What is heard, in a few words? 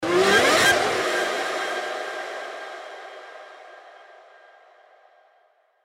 f1 engine acceleration